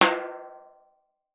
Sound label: TIMBALE H, LATIN percussion; Voice Crystal - Steve Reid Definitive Percussion; LATIN SET